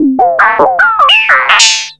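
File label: synth sequence; X-Static Goldmine 1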